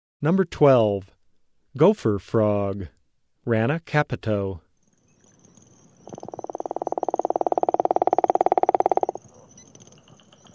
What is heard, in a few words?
Rana capito - Gopher Frog, Frog Calls; Herpetology; Bio227 - Herpetology